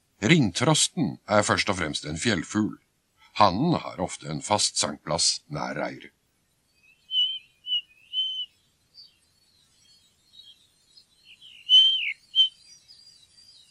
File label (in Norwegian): ringtrost, fuglelyder